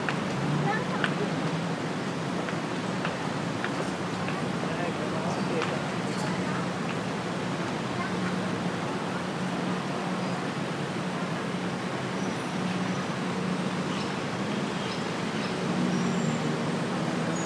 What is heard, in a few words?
bangkok buzzing